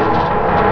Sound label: ROLL, 碰撞声音素材